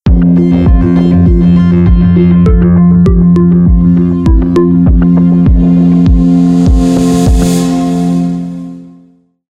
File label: Jingle